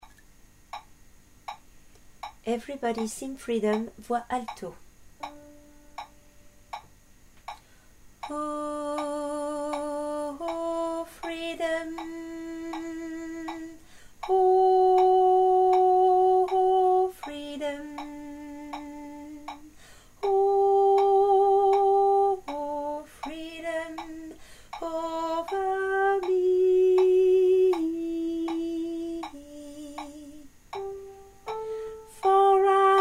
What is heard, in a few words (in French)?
alto